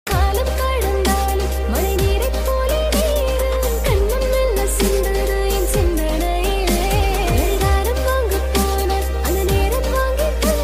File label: song